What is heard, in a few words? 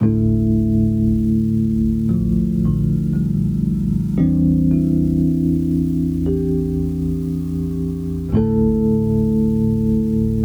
Bells Amin 115 bpm; Loops; Tonal; Keys; Organic Bells TL; Ableton Live 12 Lite; Core Library